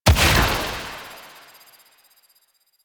Fairy Soul Explosion; DE; Warframe

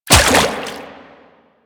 Step Water, Footsteps

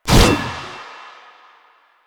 Scythe Spin Whoosh; Weapons; Stalker; Warframe

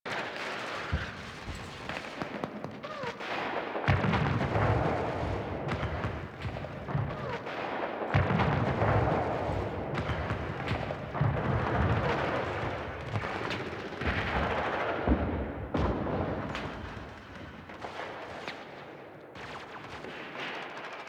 Shotgun Ambience; Cinematics; Warframe; The New War; Grineer Eidolon Shotgun; Quests

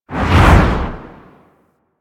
Dodge Roll Left Low, Vehicles; Movement; Warframe; Archwing

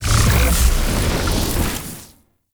Portal Transition Shattered To Vanish; Duviri

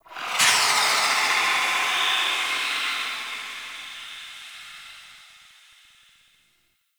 Gas Steam Release; Ambience; Warframe; Corpus Gas City

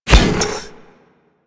Step Default

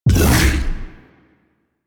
Bowl Jump; DE; Warframe; Warframes; Grendel

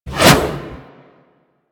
Reaper Scythe Whoosh

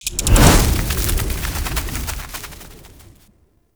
Infested K Drive Boost Fast, Vehicles

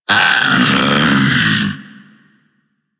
Infested Splitter Split Top Vocal Attack Medium

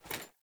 Foley Action Sword Throw Windup; Characters; Player

